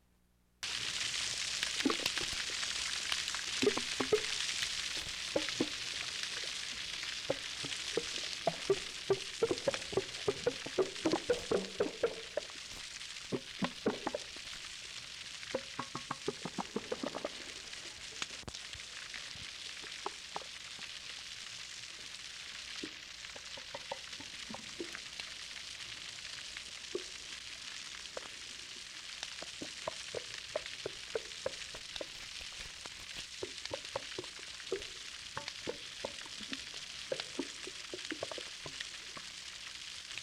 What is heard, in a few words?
Gray whale call